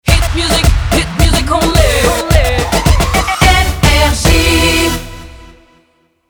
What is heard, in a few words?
Jingle Shotgun; NRJ